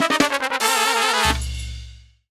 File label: Jingle Very sad; game builder garage 2021 switch